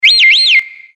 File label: piyo